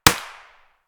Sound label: wood distant; Escape from Tarkov